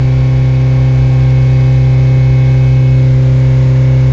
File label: Engine Constants, Transportation; E-MU Producer Series Vol. 3 – Hollywood Sound Effects